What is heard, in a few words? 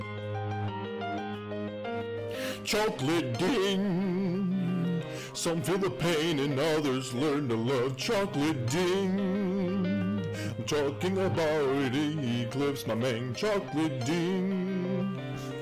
chocolate ding